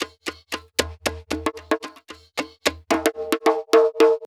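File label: PERC 1, 112 bpm, Funk; USB Soundscan vol.41; AKAI; Roots Funk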